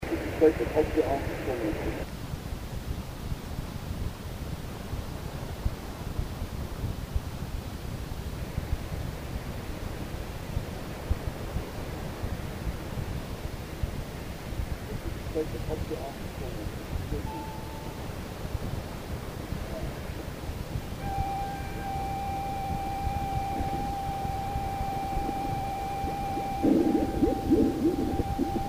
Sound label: bubbling sound; helderberg